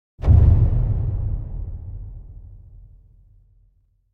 Impact Deep Drum Strike - Single; Hollywood Edge; sound designer's toolkit v2

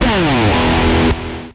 Pickup Guitar Riff; SFX Kit; Pickups

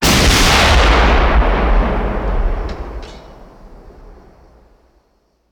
Artillery Cannon Artillery; Sound Ideas; Guns

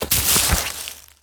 Bodyfall Leaves; SFX Kit; Bodyfalls

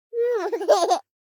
BABIES LAUGH Boy 12 months Short; Baby Boom

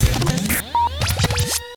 Radio Station ID

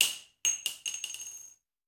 Bullet Shell; Sound Ideas; Guns

